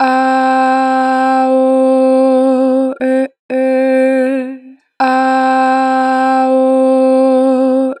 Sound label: Ah Oh Eh - 4 bar vibrato, Vocals, Natural Vibrato; Harmor Vocals